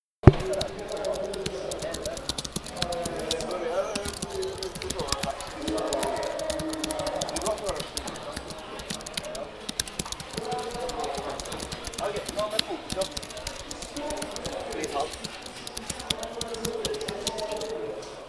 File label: dictaphone; Arcane Game DHW17